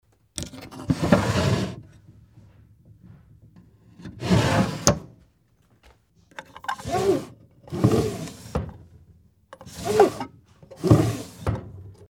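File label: drawer; hikidashi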